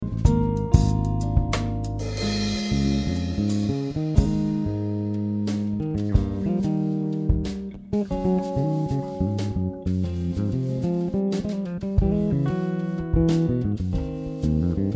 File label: bass solo